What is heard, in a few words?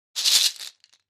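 Cabassa Shekere Single, percussion shaker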